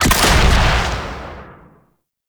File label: RPG Shot; Warframe; Grineer; Hellion Elite